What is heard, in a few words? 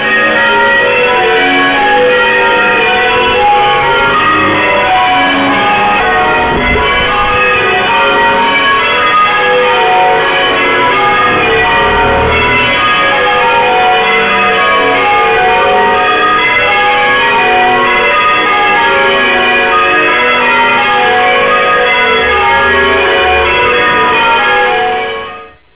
bells